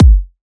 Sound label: TRAP KICK